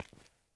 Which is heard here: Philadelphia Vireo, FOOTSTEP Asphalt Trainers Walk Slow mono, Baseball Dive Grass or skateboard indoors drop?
FOOTSTEP Asphalt Trainers Walk Slow mono